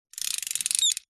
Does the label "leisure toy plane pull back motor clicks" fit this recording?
Yes